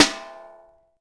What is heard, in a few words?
armour hit; AoM-ARTofMyth